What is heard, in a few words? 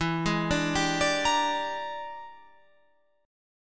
E 7th Sharp 9th Flat 5th Guitar Open F, chord; E7#9b5 arpeggiated; JGuitar